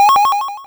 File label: coin cluster